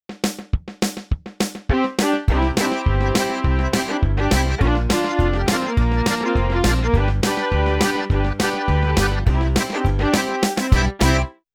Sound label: country; Multi-patch demo 1; xp-80; roland demo cd